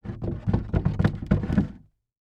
Foley Footstep Golf Cleats Stumble On Wood Platform; Foley Footsteps